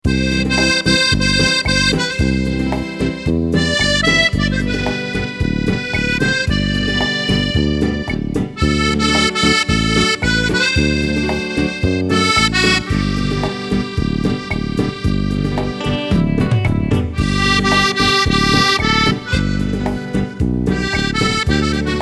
chitarra

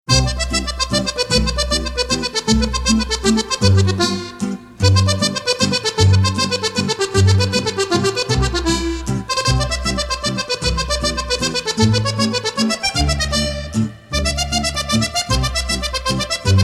arpeggiando

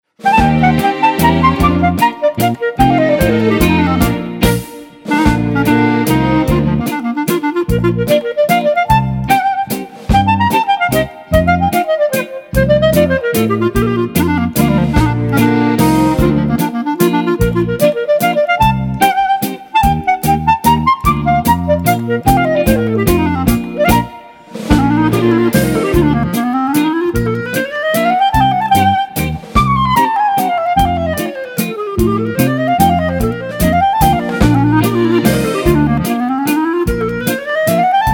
mazurka variata

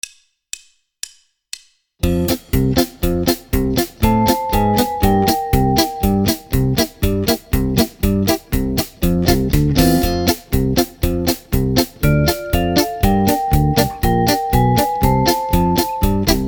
base 120 bpm

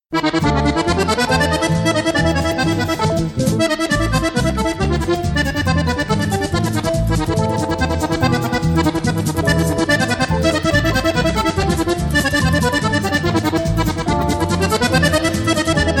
fisasamba

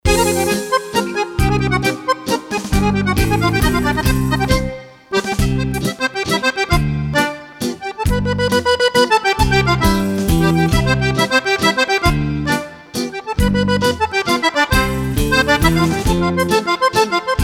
Fisarmonica in festa

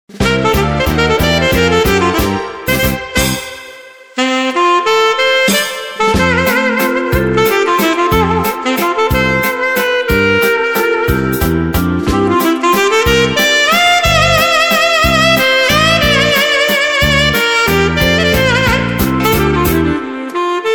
sax

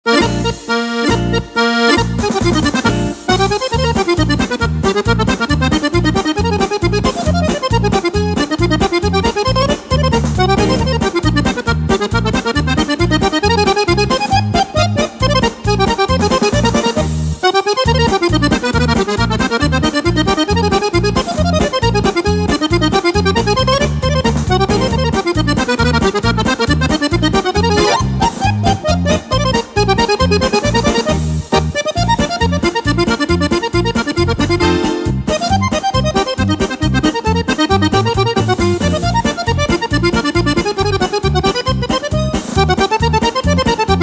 armonica indiavolata

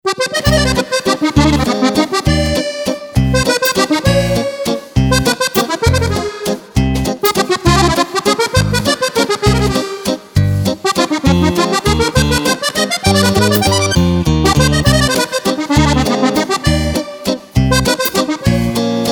fisarmonica

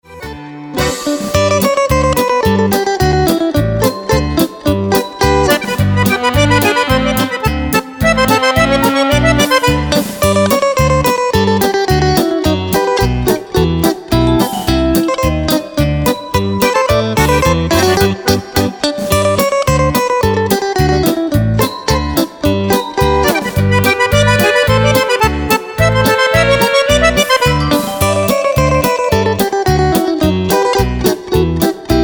CHITARRA

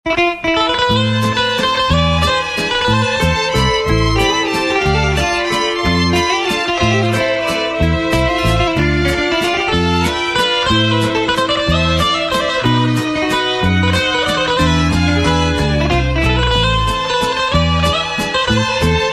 chitarra romagnola

